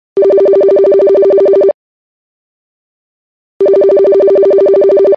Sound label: call ring